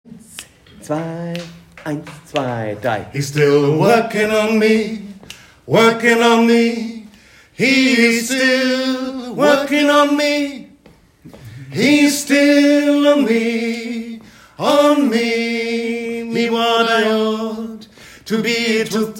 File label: Tenor